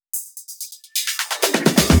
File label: Fill 128 BPM; Vengeance Samples; VEH1 Loops